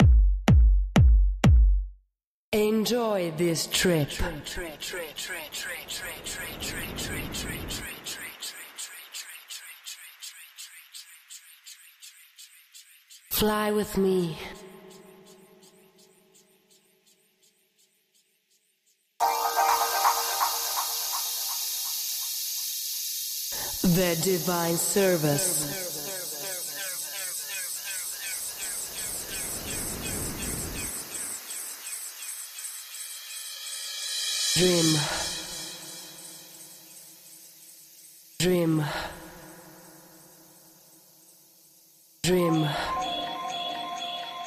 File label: Acappella